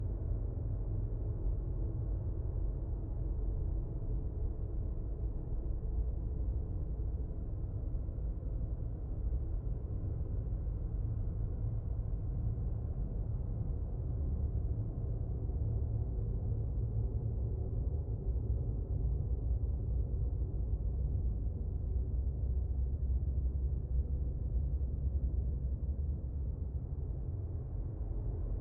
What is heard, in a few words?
Ambience Quiet